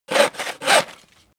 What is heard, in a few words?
hand saw wood